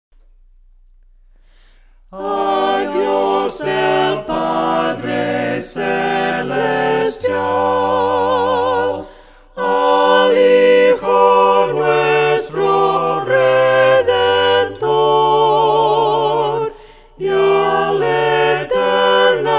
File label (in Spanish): A DIOS EL PADRE DOXOLOGIA VOCES, himnos a capella; cantos del camino